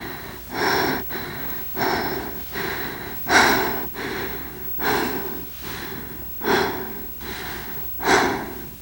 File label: exertion minor, teen girl; player; survivor; voice